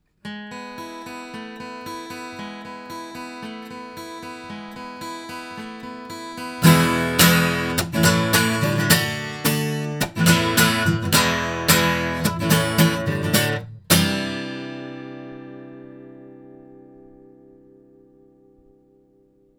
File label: Oktava MKL-5000 cardioid guitar